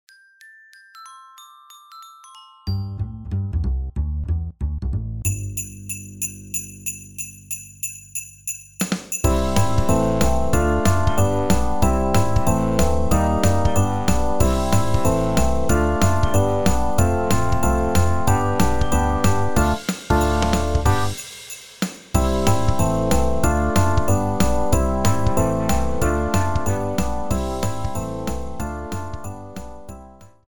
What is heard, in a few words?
Karaoke Version